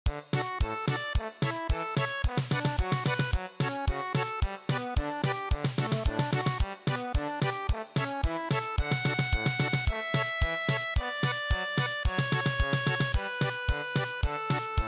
Canon in D, German Polka

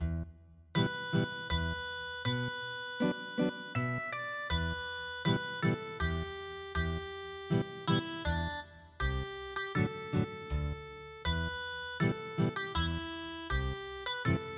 Ethnic Guitar; midi; traditional

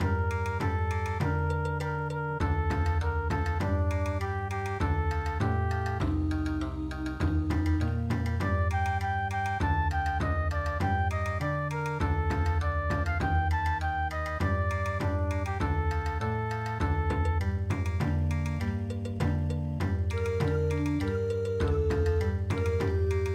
Bon Odori

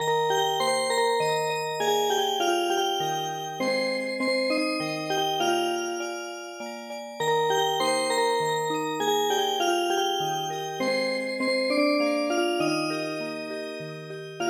leaf boat Music Box; ミディアムテンポな3拍子の韓国・朝鮮の伝統曲です; ＰＣ ＭＵＳＩＣＢＯＸ

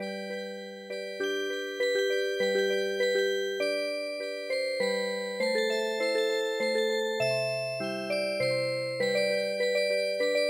Music Box, traditional; East India Nightingale

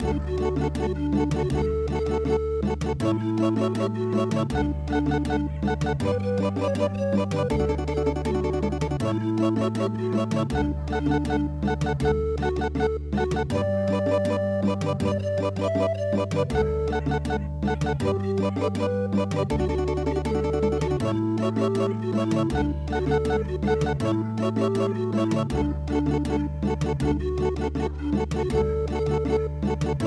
Andes

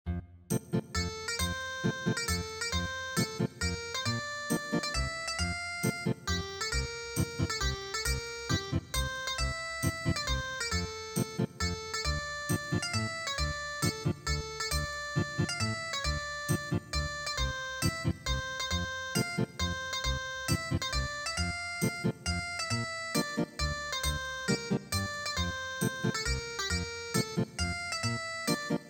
Ethnic Guitar, traditional

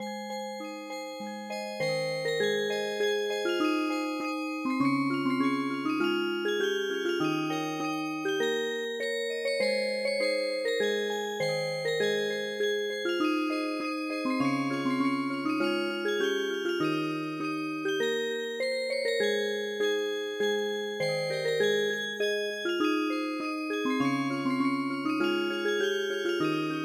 Music Box, traditional

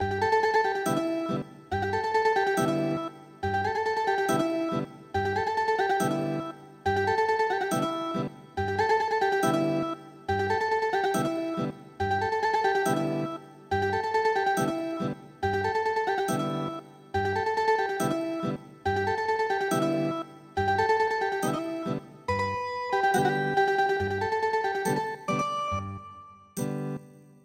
Ethnic Guitar

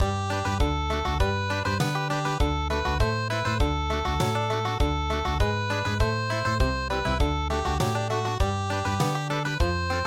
Country, midi; Hearts ease

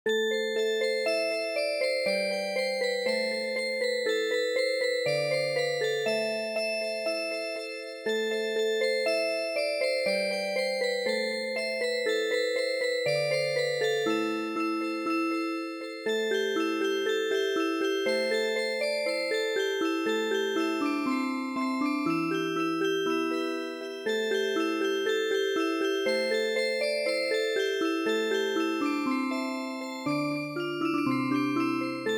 Music Box; Qazan March

